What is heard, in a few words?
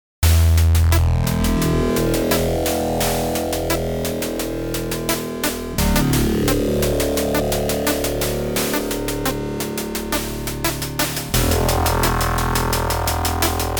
neurofunk i guess opl3; weeklybeats 2026